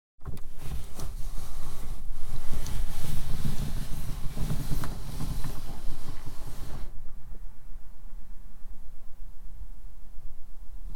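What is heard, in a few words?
interior Window wound up and down, Cars; BBC Sound Effects Library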